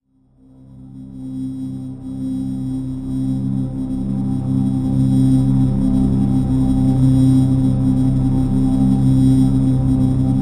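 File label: drone; Neutrality C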